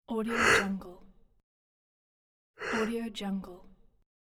yawn

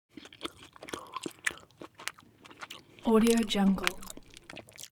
human mouth chew jellybaby smack male

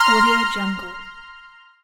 bonus item prize drop

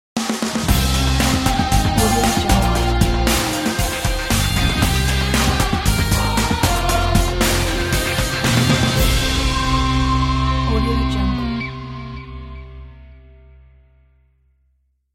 rock ident

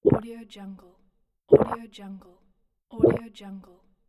drinking gulp

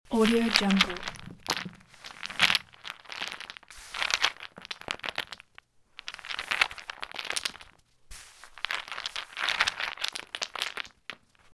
wooden blocks mixing and spilling

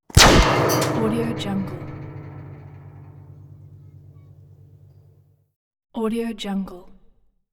bullet whistle impact metal debris

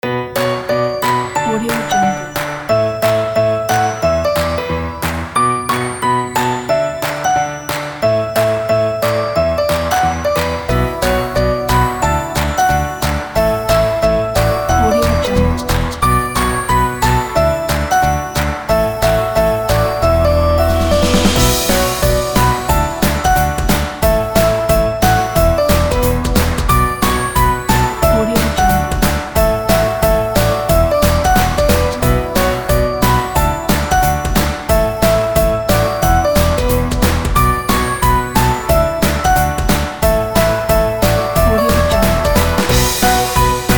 upbeat energetic fun

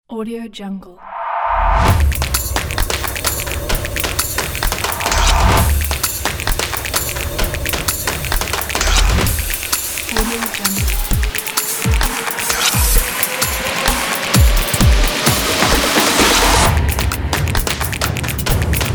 rhythmic

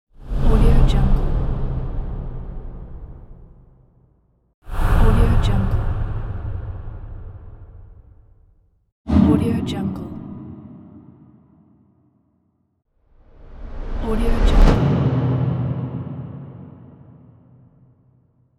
deep cinematic woosh

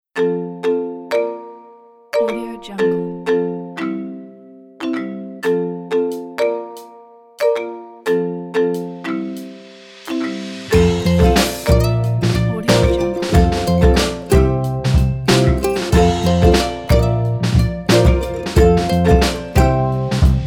happy upbeat kids

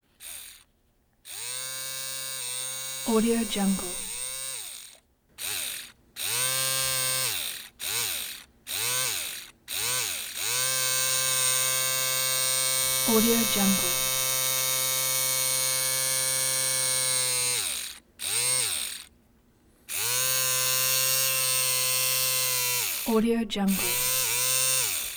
nose hair clipper small motor electric razor; prosoundfx

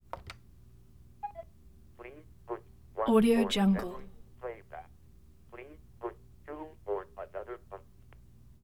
answering machine; prosoundfx

